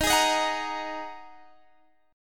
A sharp 7th Suspended 4th Sharp 5th D sharp, guitar